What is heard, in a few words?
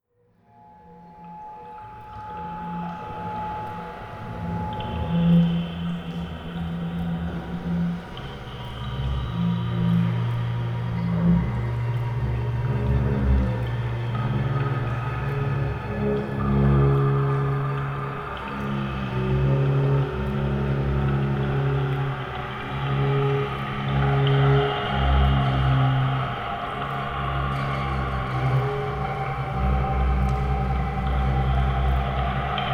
Shadow Sounds selection, London